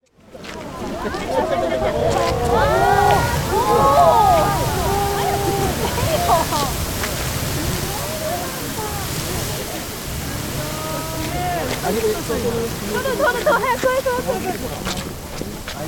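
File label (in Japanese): kanketsusen, intermittent spring; 間欠泉（諏訪湖名物！）; Chino Cultural Complex; 茅野市民館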